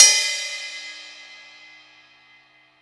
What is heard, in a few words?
17 Inch Thin Crash Bell; Pro Sounds Sample Collection; Drums And Percussion